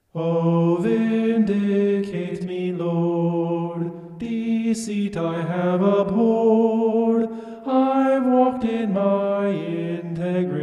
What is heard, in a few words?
vocal homoph; psalm 26